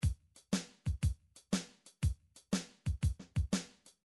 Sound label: midi drums